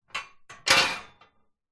metal frame loose set down clatter; zapsplat

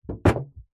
metal tin lid flex bend; zapsplat

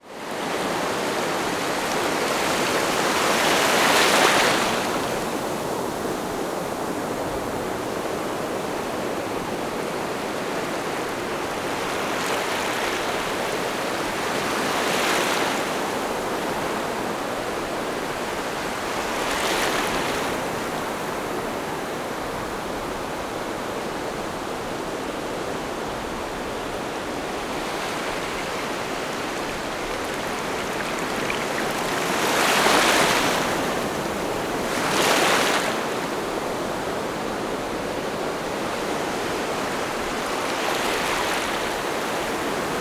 ocean waves wash up close distant surf; zapsplat

